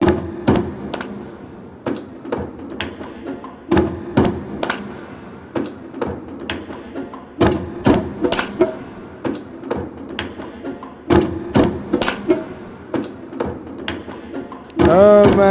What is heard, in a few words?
DRUMS